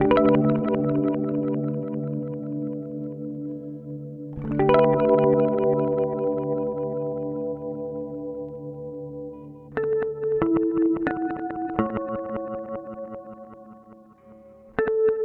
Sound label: Source Audio Collider Reverse Delay